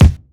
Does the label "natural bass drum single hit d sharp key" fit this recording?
Yes